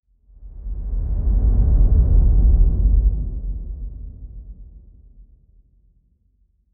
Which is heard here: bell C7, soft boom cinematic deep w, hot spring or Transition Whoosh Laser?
soft boom cinematic deep w